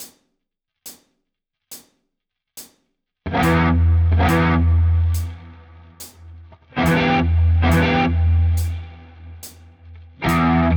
Coole Rhythmen mit Delay 70 BPM ¼ 1 Wiederholung Rhythm. Variation rechte Hand

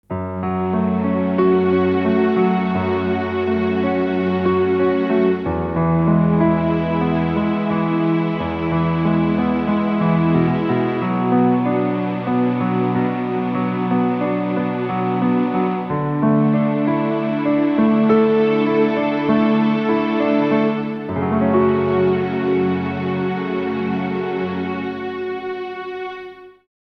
Piano und Strings

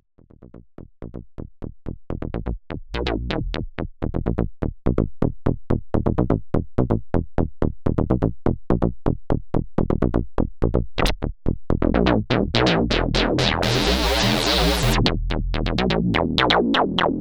Bass manuelles Morphing

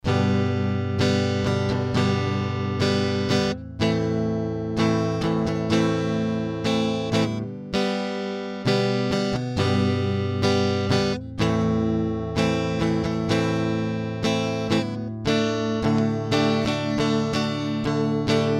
E Gitarre vintage; Universal Audio Volt 2

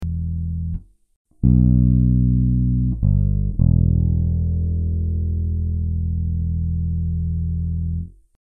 Harley Benton JB 62CC Beide Pickups Tone Regler geschlossen

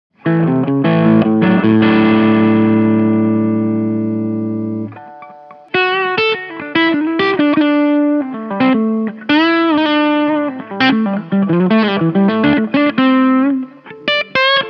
Steg PU Kanal 2 Drive on Klangregelung 12h Reverb

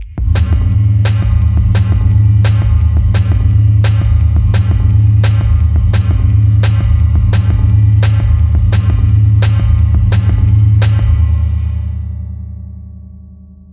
Gong Beat Gong kleine Ketten; AudioThing Hainbach Bundle

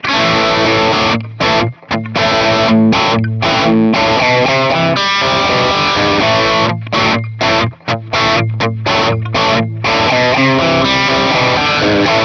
Doppelmikrofonierung SM57 MD421 Pan LR

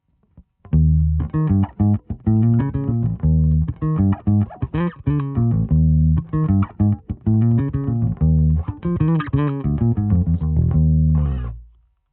Bass SVT mit Comp und 1x15; Quad Cortex

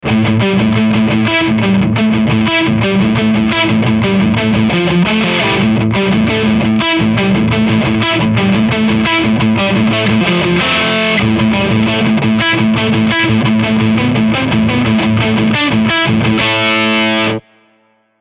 Riff Steg HB Overdrive

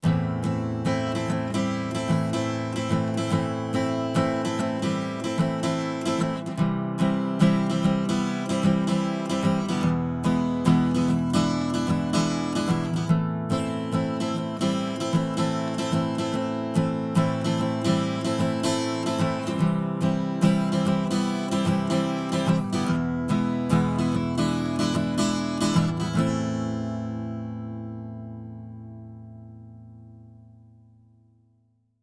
Gitarre; Manultec MT-E.8012; ORCA BAY; ohne ORCA BAY

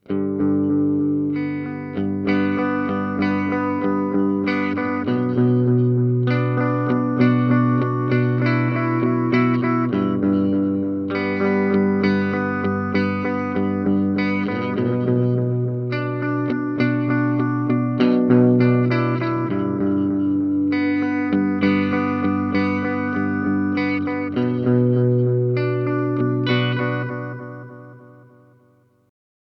Boss DM 101 Vintage mit Vibrato Modulation

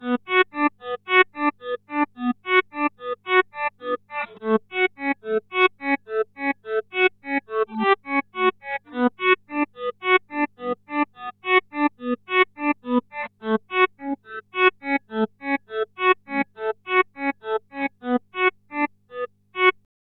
EHX Pico Attack Decay Poly Decay ganz zu

